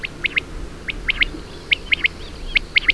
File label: Coturnix coturnix; pticy